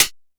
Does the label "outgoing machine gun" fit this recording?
No